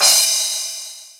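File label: R8 crash; X-Static Goldmine 1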